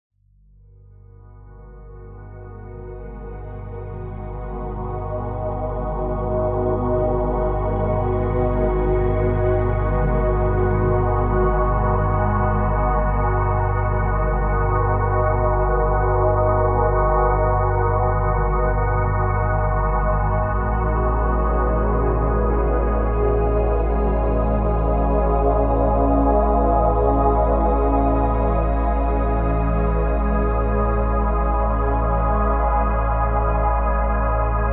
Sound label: Deep Healing Soundscape; Frequency Booster Audios; AstralHQ